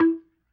B3 PERC, Vintage Keyboards

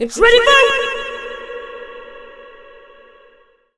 READY 4, Funky Vocals, SHOUTS; USB Soundscan vol.18

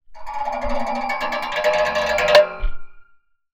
Radiator, contact mic, Metalics

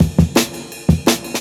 170, Jungle Breaks; Church; Original Jungle Breaks

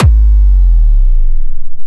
Sub Kicks, Bassdrums; Vengeance; Essential Clubsounds Vol.4

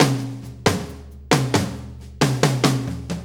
4-4 Fill, Drum Loops

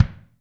BD, DRUMKIT; Best Service; Hyper Dance

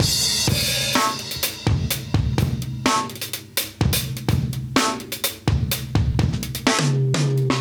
126 live breaks

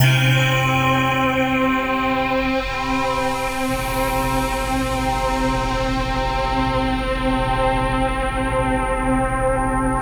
BELLOX C3, Ethereal Atmosphere; USB Soundscan vol.13; AKAI

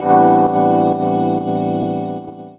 Chords Tones; Equipped Music; Loungin House

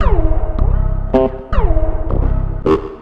Space Of Bass, Synth Sequences

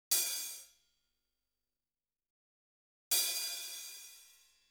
hi hat 1+2 open; Sound Sampling Collection VOL-5; Drums & Bass Fusion Funk Effect

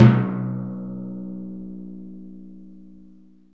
PRC TIMPAN, Timp Roll Dry; Roland LCDP03

